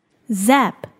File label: zap